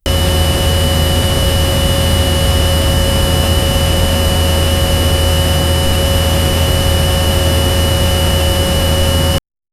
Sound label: old 90 s computer start